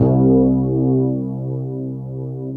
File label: WET ROAD C1 R, Keyboards Old School; USB Soundscan vol.09; AKAI